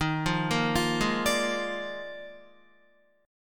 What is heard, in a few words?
Eb Major 7th Suspended 2nd Suspended 4th, Guitar; arpeggiated; 11,8,8,10,8,9; EbM7sus2sus4